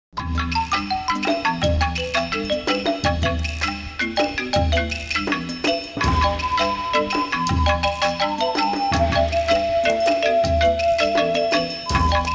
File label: Tribal Tune